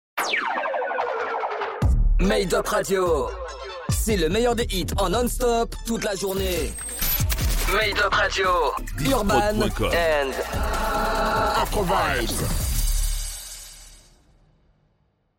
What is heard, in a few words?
Radio Jingle Urban and Afro vibes Mix